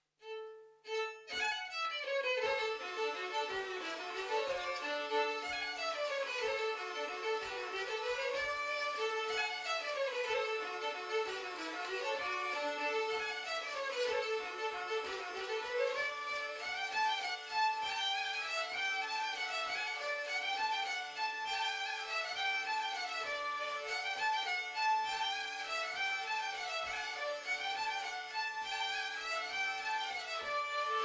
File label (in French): Bourrée; Jean Pons